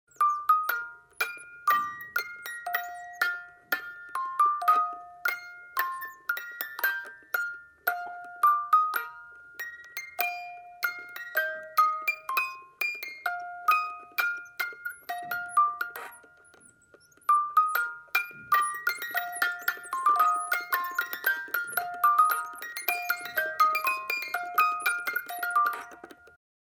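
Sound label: melody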